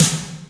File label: SNR, DRUM ZONE; Masterbits - Total Zone